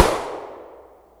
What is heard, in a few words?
gunshot - IDFT(abs(DFT(sample))) post-processed by cepstrum method